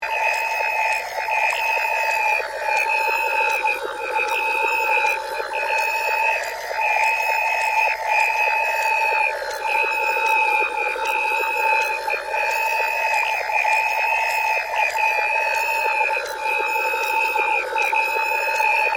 Transmission Search, Scifi, Transmission, Search, Tune, Scan, Radio, Science, Fiction; Communication